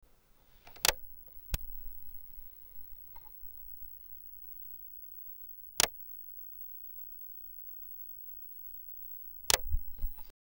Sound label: asmr tv ambience noisy tv; electronics; asmr to ambience noisy tv